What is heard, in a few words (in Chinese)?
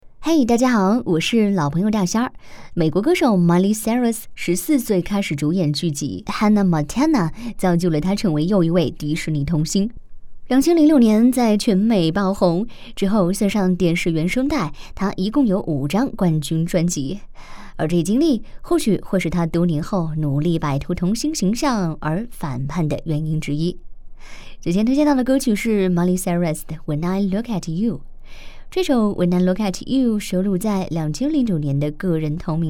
欧美音乐